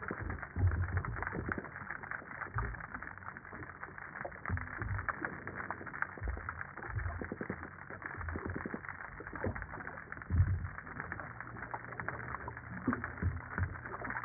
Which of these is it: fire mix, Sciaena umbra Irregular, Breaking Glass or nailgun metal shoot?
Sciaena umbra Irregular